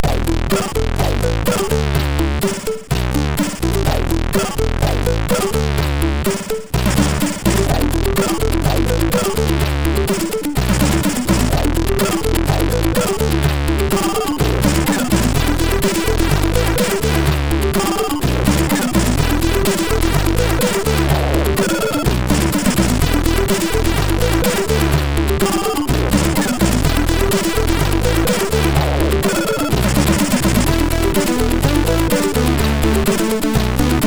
funky, MOS 6581R3; sid; Funky Stuff

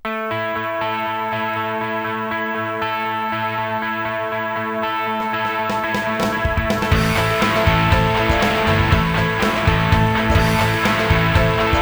soft rock; DIGI Booster (DIGI)

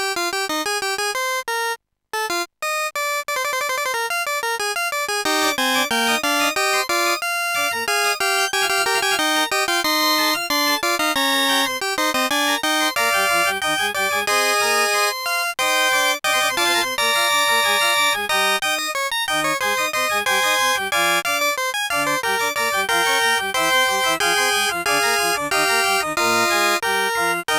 fugue; Deluxe Music Construction Set

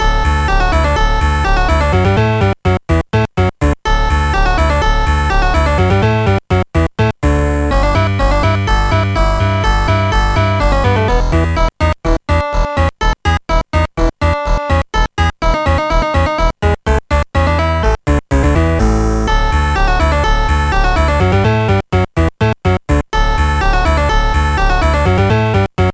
musette, OctaMED

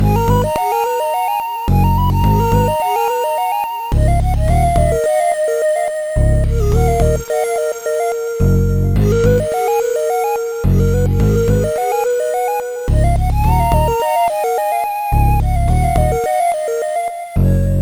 flute love

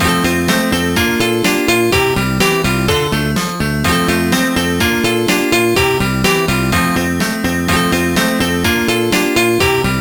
piano in f; Haywire; Trackers; Modland

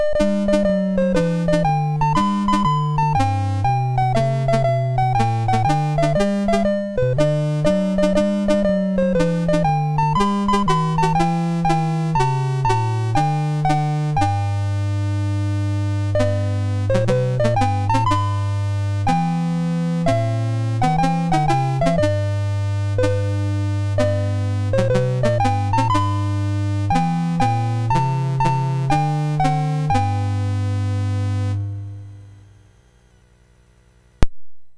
Battle Hymn, MOS6581R3; sid; BASIC

